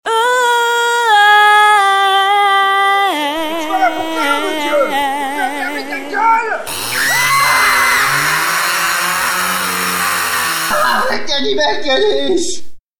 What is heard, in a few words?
chainsaw jingle